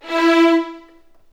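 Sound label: E4 violins; The Ultimate String Collection; East West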